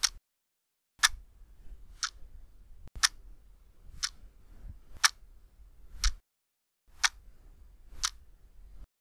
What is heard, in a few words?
clock; real; machine